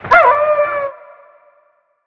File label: win wolf; wolfcub